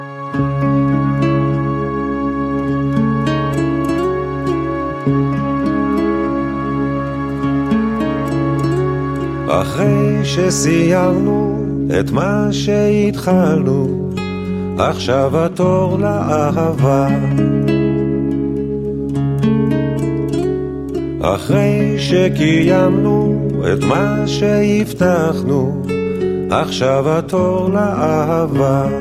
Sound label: Having Fun